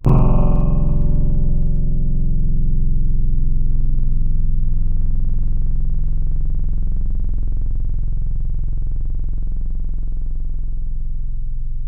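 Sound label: Acoustic guitar; Synthetic's Serum Collection; Echo Sound Works Core Tables; ESW Real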